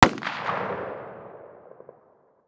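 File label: m16a1 single distant; vj weapons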